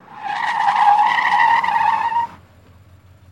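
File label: Cadillac Long Skid To Stop; Cadillac 1972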